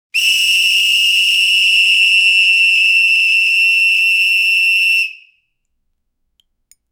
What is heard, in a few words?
triple lifeguard short wh, whistle